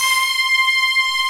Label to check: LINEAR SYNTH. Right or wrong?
Right